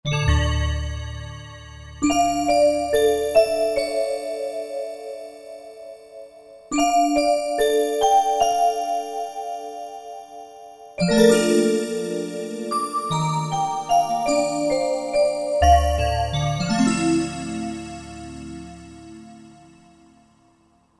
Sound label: Music Bells; Roland JV-2080